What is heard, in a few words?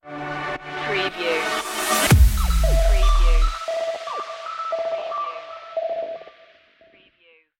cosmic funk stinger